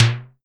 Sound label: SIMMONS SD-5 drums